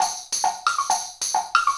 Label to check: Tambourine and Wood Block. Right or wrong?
Right